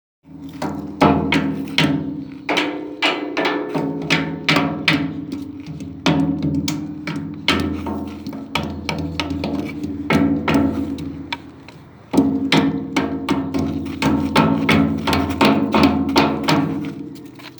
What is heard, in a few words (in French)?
cloches enfants brouette